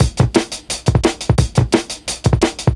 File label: DNB BREAK